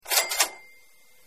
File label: money cash register end; Delicious Emilys True Love